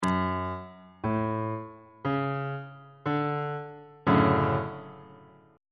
e double flat major 7th 1st inversion on bass clef